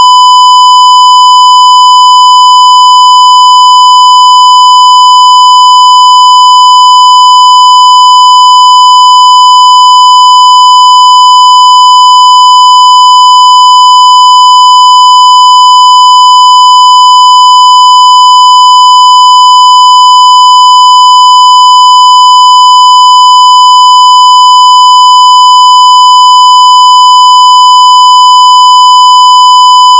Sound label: Calibration 1kHz +15dB